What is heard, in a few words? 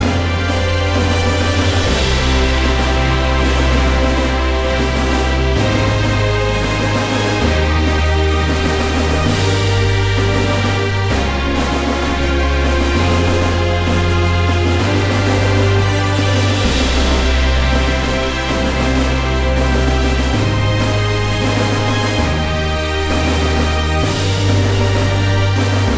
In Copter Epic